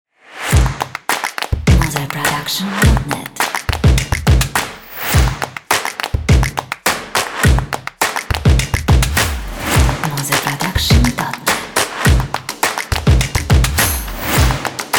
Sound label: Taiko World, Energetic Percussive Claps and Stomp